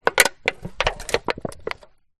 drink can cola squash in hand gently small amount liquid inside